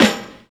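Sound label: death snare; Drums In Your FACE!; HIP-HOP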